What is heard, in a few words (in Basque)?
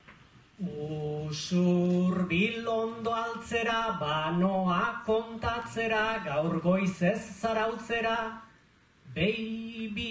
agurra, bertsoa; usurbil